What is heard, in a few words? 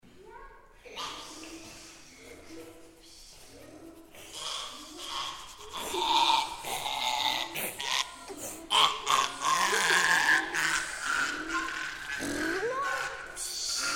Purring Ginger